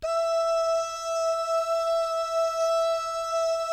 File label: "DOO" GUYS, Vocal Jazz Fx